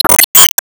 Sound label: Emo Pain; Disney Star Smash; Android, iOS, Mobile